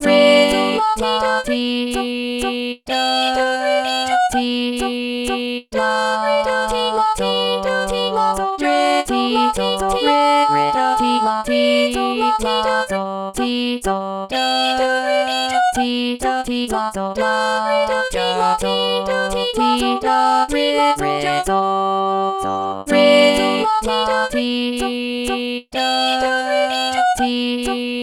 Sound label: minuet in g major